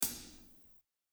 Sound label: hihat